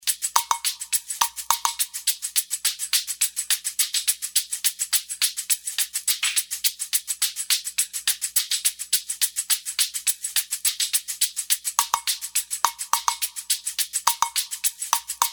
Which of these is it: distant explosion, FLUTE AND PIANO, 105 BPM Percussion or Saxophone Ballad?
105 BPM Percussion